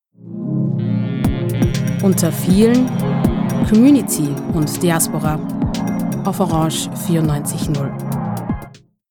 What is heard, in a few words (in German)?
spartenjingle; unter vielen; orange